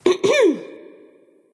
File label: clear throat; cupid